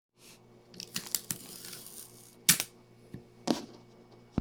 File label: stretched tape off of a roll